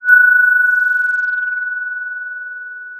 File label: Sound of a phone ringing